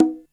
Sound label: Conga High, Drums, Roland MT32